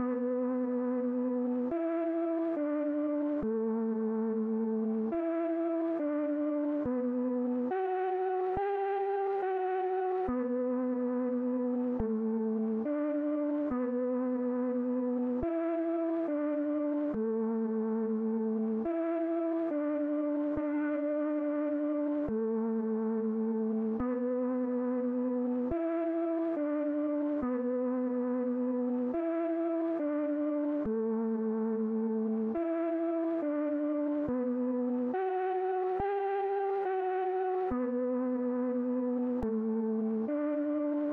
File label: 140 BPM E Min Flute; Cymatics; Destiny Gold Expansion; Chardonnay